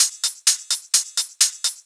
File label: Hihat Loop 128 BPM; Best Service; House Definition VOL-1